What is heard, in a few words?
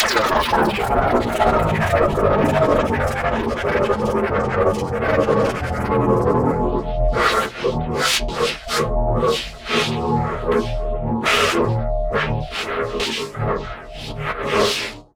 Weird dystopian fal